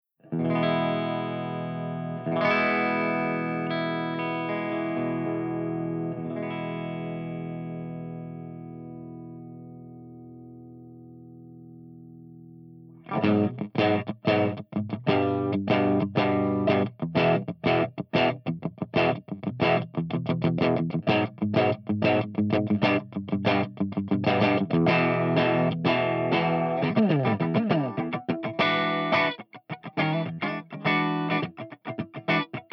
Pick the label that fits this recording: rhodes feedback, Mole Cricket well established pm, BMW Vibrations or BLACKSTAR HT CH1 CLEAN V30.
BLACKSTAR HT CH1 CLEAN V30